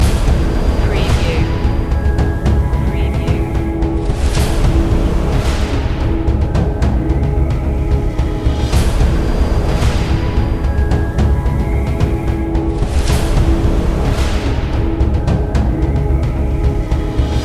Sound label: black banner loop